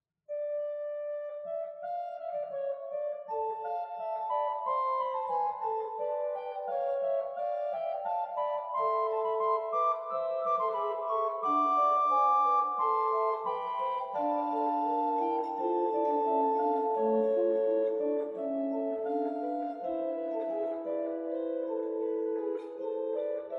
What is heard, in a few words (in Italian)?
Flauto in ottava